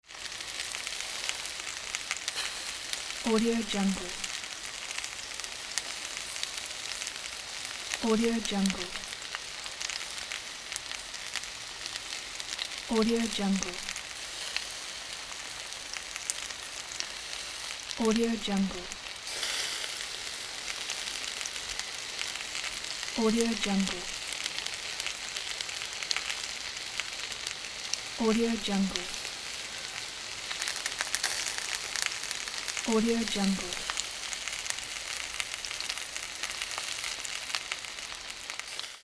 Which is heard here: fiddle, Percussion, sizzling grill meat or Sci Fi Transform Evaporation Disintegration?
sizzling grill meat